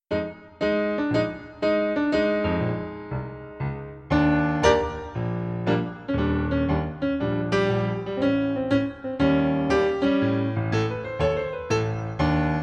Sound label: Centre Tendus